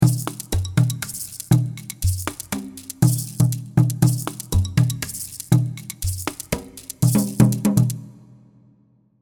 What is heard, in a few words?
tiki percus; tiki percussion kit; tiki instruments; chiqui audio